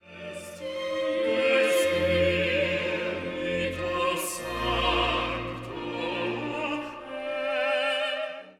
Tenor yelp; Et incarnatus est

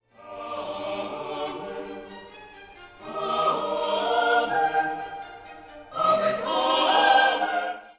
Gloria Amen laudamus

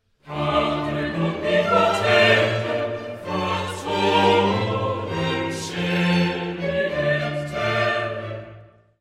Credo Patrem omnipotentem

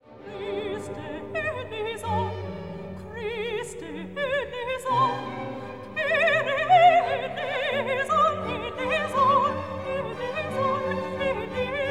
Christe Soprano

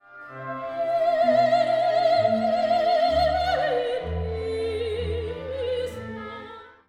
Kyrie Soprano

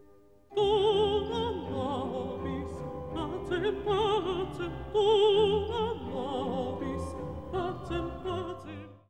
Dona nobis pacem solo

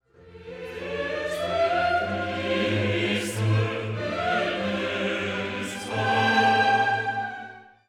Kyrie Sopranos found

